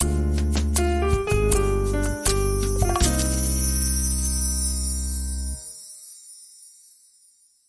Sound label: Bossa Sting